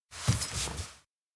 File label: turn; spica; anemoi